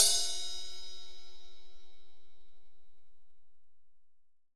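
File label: 18 AMBIENCE RIDE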